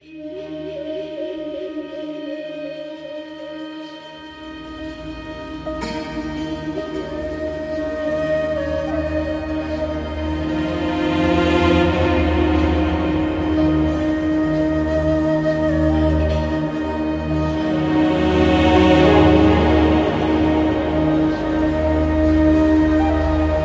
Islamic; ramadan 2025

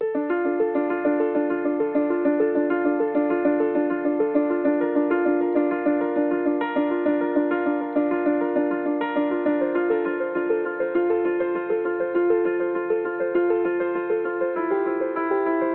Inspirational

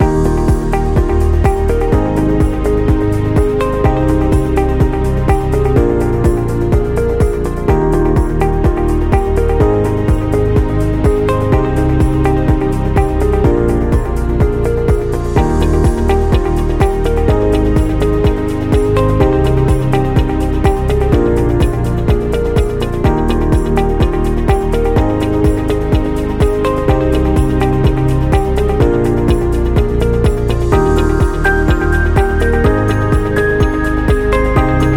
Corporate Loop Positive

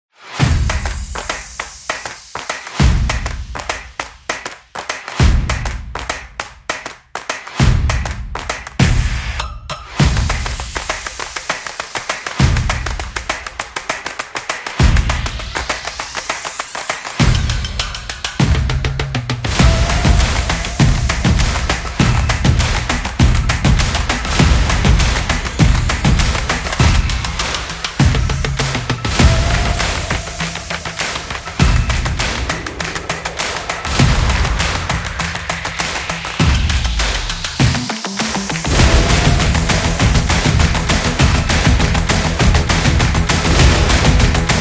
Stomp Opener

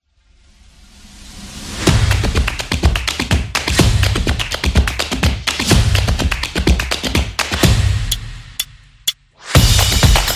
Dynamic Energy; crossfit

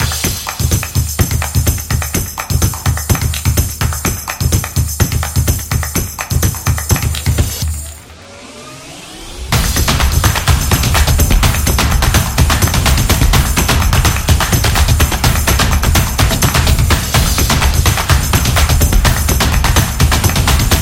Promo Drum Beat

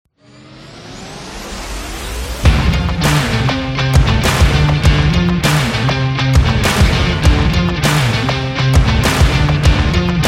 Rock Party

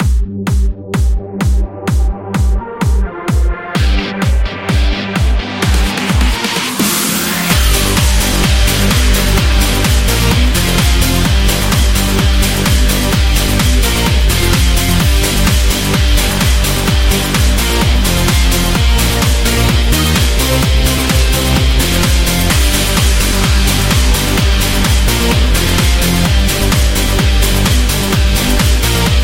Pop Energy, corporate music; corporate music vol 18

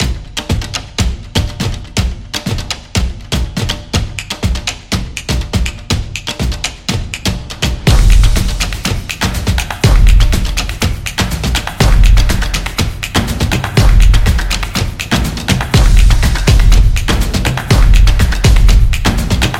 The Drum Beat; stomps and claps

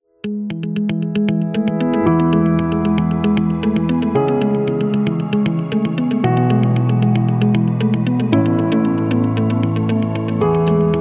Corporate Technology

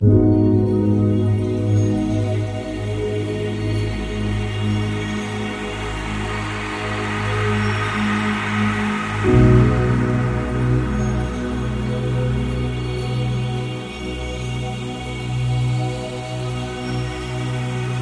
Spiritual

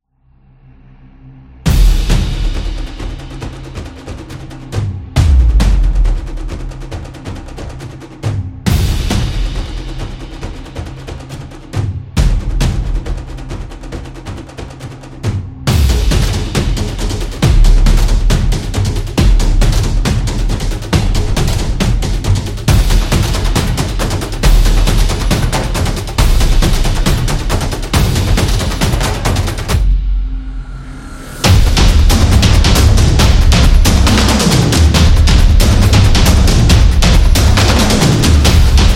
Horror Chase

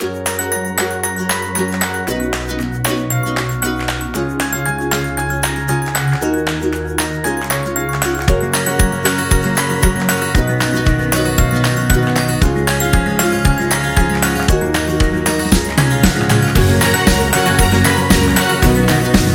Background Happy; birthday music

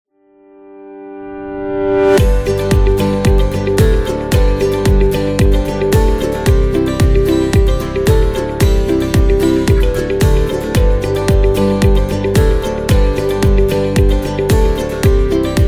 Positive Acoustic Corporate; corporate music vol 5

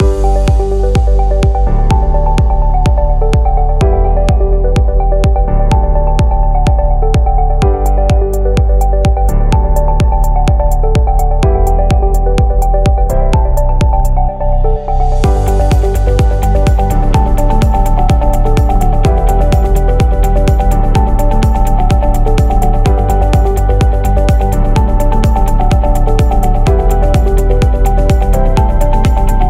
Technology Upbeat; corporate interview

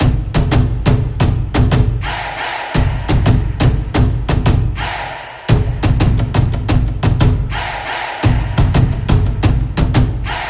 This Is Sport Drums; percussive nation vol 3

